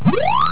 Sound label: eat